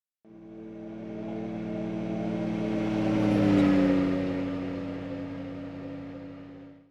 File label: scifi vehicle passby